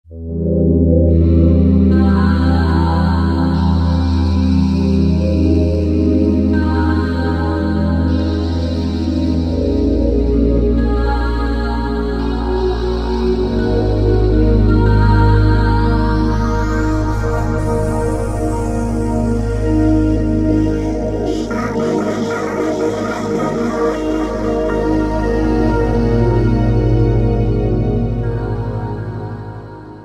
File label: Vox; Roland V-Synth; Vox Dreams; Factory Patches